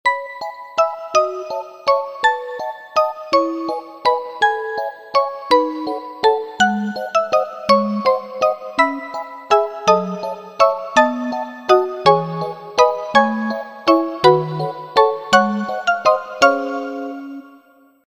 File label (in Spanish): tono de llamada iphone x